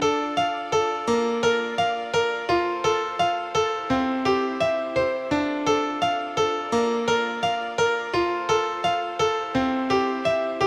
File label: Kyrgyz Mix